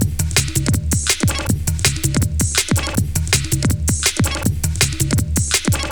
162, breaks, Loops; M8

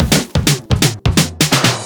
Fills 128BPM, Clubsounds

